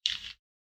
footfall outdoor step; soundscrate

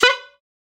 Bycicle Horn; SoundsCrate

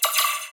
scifi weapon blaster accent

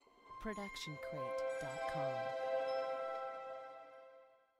Friendly Ident; SoundsCrate

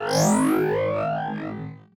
Machine Charge Up; SoundsCrate

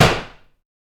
bullet impact wood

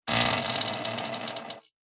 Fart Noise; SoundsCrate

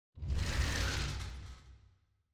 designer scifi mechanical movement; SoundsCrate

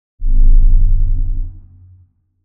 large space ship short rumble; soundscrate

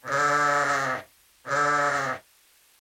sheep

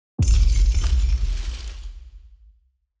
designer scifi mechanical hit; SoundsCrate

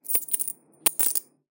coins toss; soundscrate

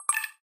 graphics digital notification; soundscrate

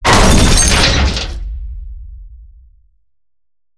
crash; SoundsCrate

